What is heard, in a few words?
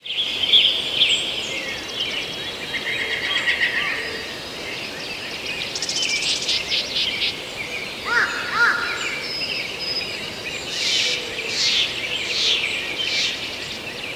Ambient Birdsong; ffxiv; Orchestrion